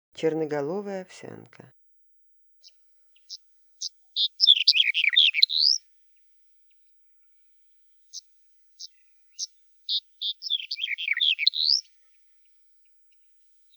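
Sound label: black-headed bunting voice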